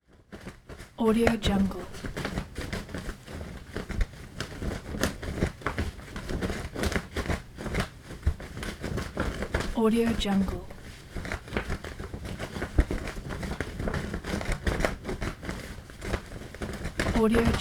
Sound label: flag